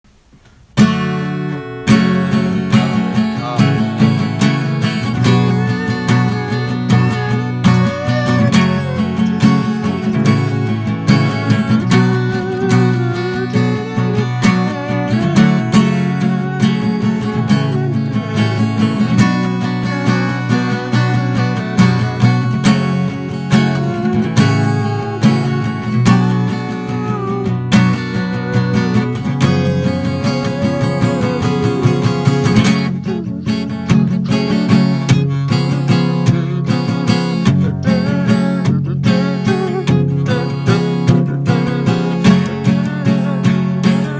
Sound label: guitar solo